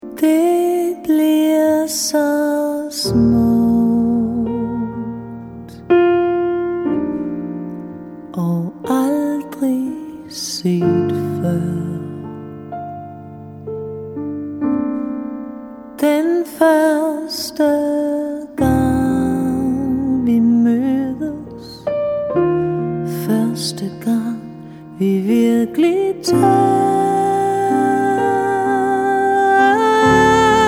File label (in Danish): sangerinde med piano